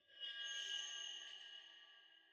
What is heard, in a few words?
ambience arena creak